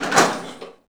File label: door metal open; left4dead